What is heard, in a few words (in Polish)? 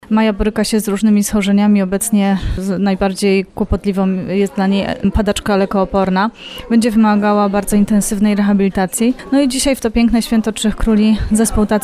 koncert; dolina jastrzebska